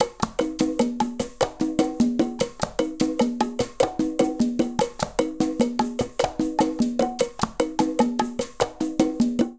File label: Colombia 100bpm, Latin Percussion; Ableton; Factory Packs